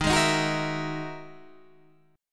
E Major Sharp 11th D sharp

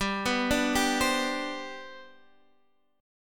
C Major 7th Suspended 2nd G, chord

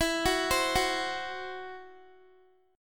E Suspended 2nd Sharp 5th, Guitar; Esus2#5; arpeggiated

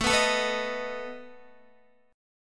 A Suspended 2nd Flat 5th, Guitar; Asus2b5; strummed; x,12,11,14,11,11; 4F,4A,5C,5G,5C,6E; JGuitar

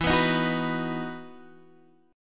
E Major 7th Suspended 2nd

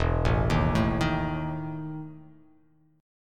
E Major 7th Suspended 4th

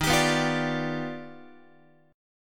D sharp Augmented Major 9th D sharp Guitar; strummed; x,11,10,9,9,13; JGuitar

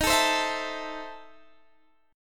E Major 7th Suspended 4th Sharp 5th, D sharp

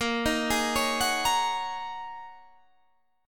G sharp 7th Suspended 2nd Suspended 4th

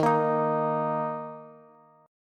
Eb 5th, Bass; Eb5 strummed; x,18,20,20; JGuitar

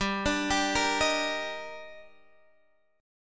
D sharp Major 7th

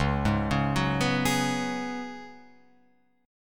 C sharp 7th Suspended 4th C sharp, Guitar Open F; C#7sus4; arpeggiated; tuning=Open F; guitar chord

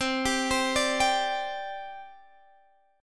C Suspended 2nd C Guitar Half Step Down

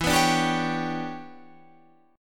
E Minor 13th guitar chord, Half Step Down

